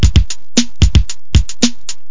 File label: techno; Sounds Terrific II; Weird Science